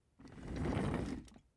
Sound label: office chair trundle slow